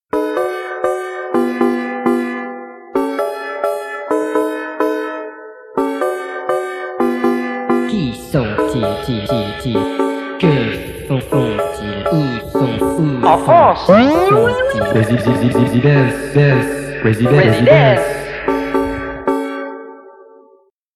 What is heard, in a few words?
Jingle